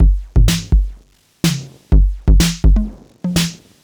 drum electro stripped 125, Classic Chicago House Drum Loops